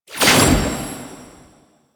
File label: Rune Pickup; Warframe; Entrati; Mirror Defense